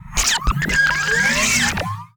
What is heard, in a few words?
servo morph hitech; soundmorph